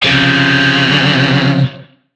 Shawzin Phrygian Chord D; Warframe; Lizzie Shawzin

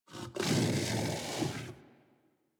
Kubrow Armored Vocal Breath Walk, Enemies; Warframe

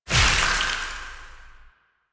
Void Release; Weapons; Warframe; Duviri; Incarnon Adapters

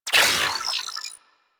Ship Advert Hologram On; Ambience; Props; Warframe; Corpus Ship Remaster

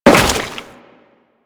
Crewman Step Goo, Footsteps; Warframe; Corpus

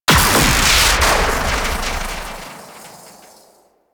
Electrify Cable Impact; Warframe; Teralyst; Ropalolyst

